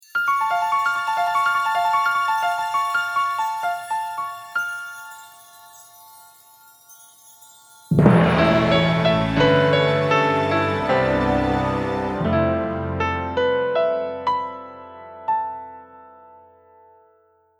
Weird Sword Stinger; original soundtrack; The World Next Door

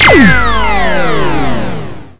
Drop ball; Red Hot Sounds; arcade

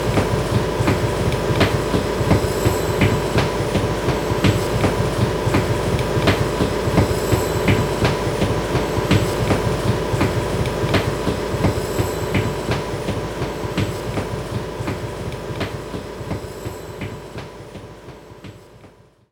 running

